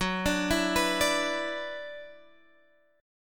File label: Gb 7th Suspended 4th Sharp 5th Gb down Guitar Standard; arpeggiated; JGuitar